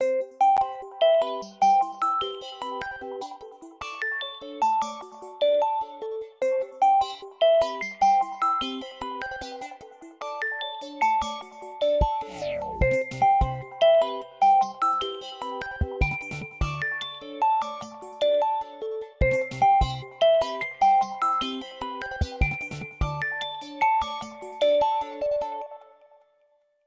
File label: Time Travel